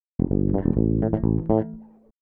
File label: DEEPHOUSE BASS 125 A